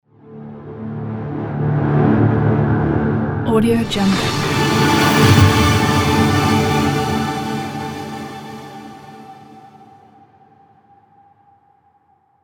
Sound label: cold winds logo intro opener